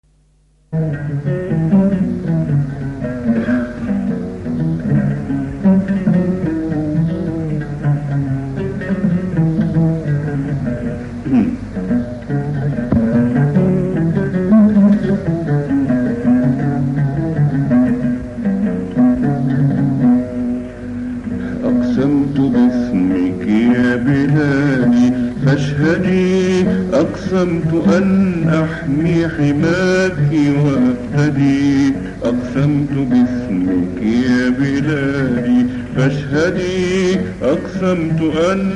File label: oud